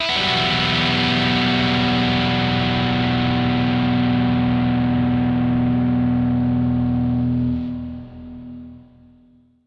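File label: dirty guitar pitch shift doubler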